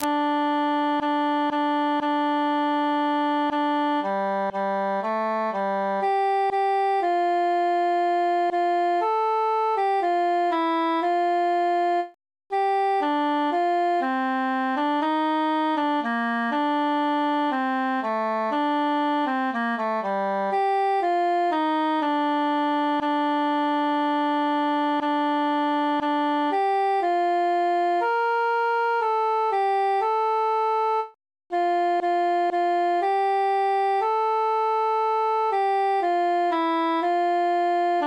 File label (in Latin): altus solo